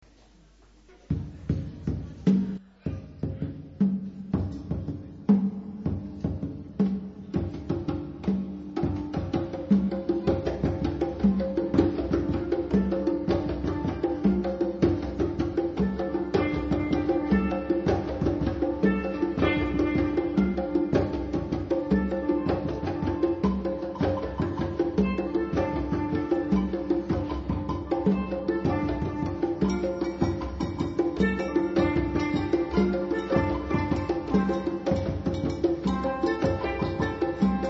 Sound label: Bomba